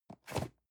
cardboard box medium place slide soft; objekte

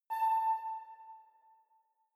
sci-fi facility ai awake; soundpool

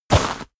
step walk gravel

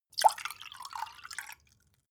glass pour soft; soundpool

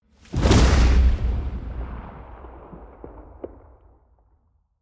soft gunshot whoosh; schusswaffen

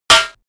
metal impact sauce pan hard

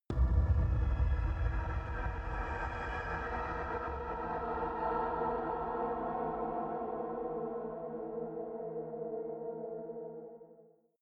sub atmospheric evil, horror

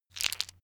stone gravel clatter short; natur-tiere; steine

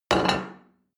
plate place table hard

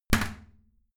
toilet lid close heavy, badezimmer; haus-garten

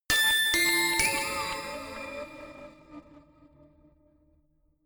notification glockenspiel crazy triad up minor; instrumente

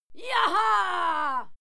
female cheer yaha; schreie; menschen

